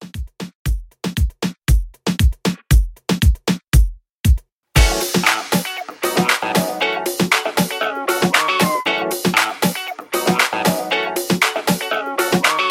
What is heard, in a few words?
Old School, 117Bpm